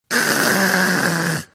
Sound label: throat itch clearing sound